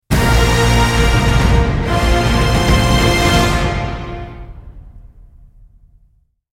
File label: Fight Finish Jungle; Marlow Briggs and the Mask of Death